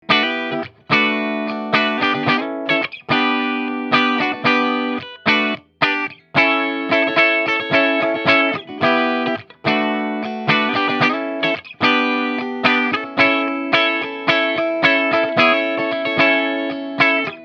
JTM45 Les Paul clean

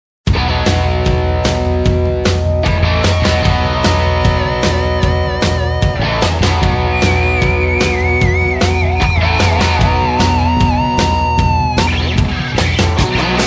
Strat Straight Rock Slide

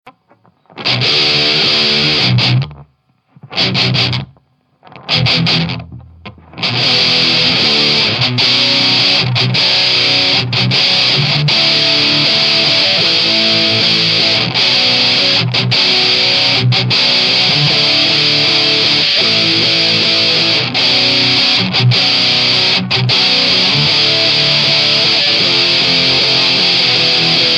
JTM45 Cort Catana Boss MT2

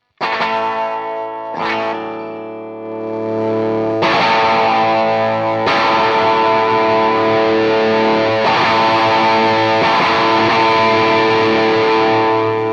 Les Paul Overdrive pedal

